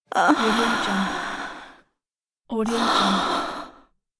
sexy female moans

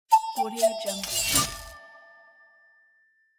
short logo

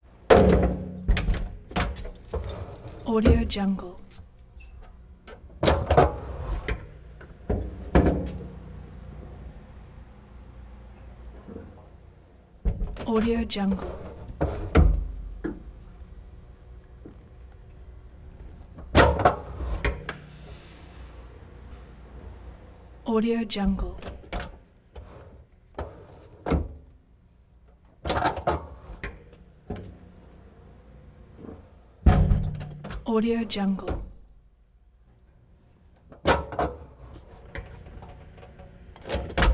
watertight door aircraft carrier open close

